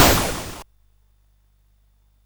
Dark Castle, CSG8580R5

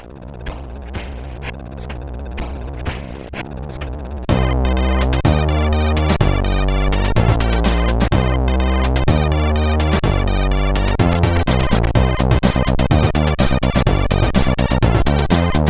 Digital Stomp, sid CSG8580R5

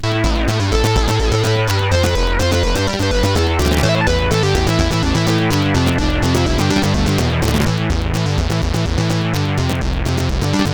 tune, sid 8580R5

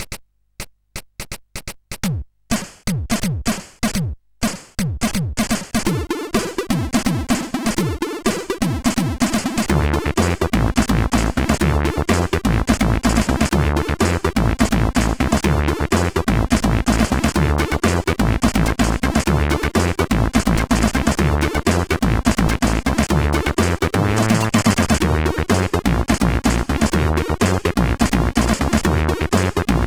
House Mix, CSG8580R5

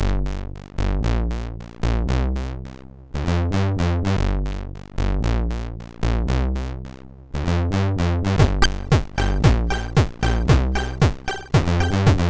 Spectrum tune MOS6581R2

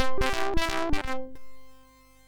Clik Clak, MOS6581R3

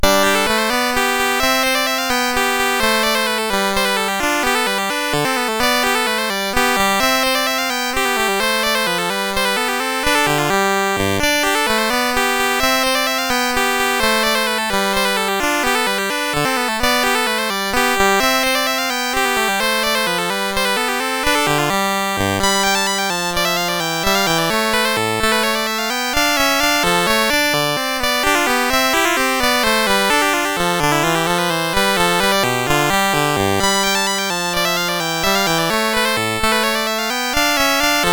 Minuet in G, CSG8580

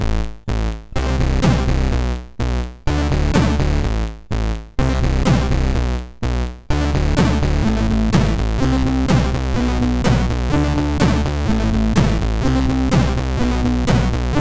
Bad Vibes, MOS6581R3; sid

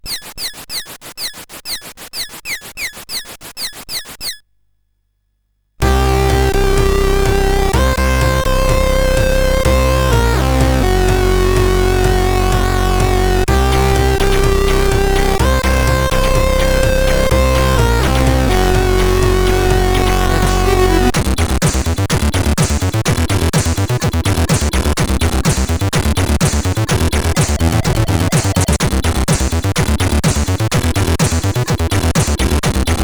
Colony, CSG8580

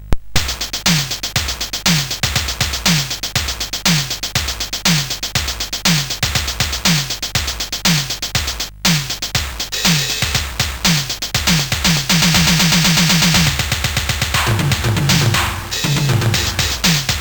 Digi Drums, sid CSG8580R5

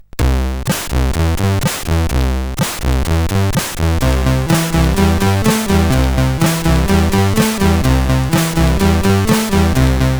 Rock, CSG8580R5

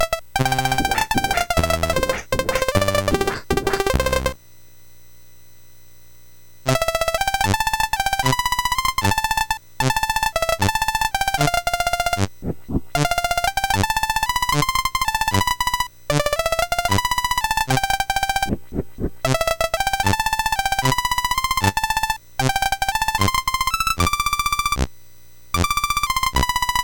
Ducks Ahoy, MOS6581R4

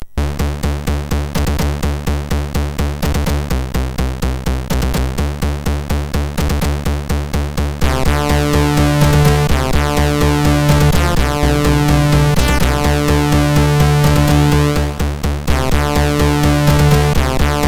Semi-suspenseful Space MOS6581R4

